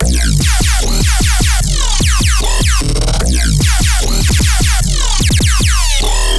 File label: Bass Loop D#, Dubstep, 150bpm; Weapon; Ghosthack; Dubstep Sound Arsenal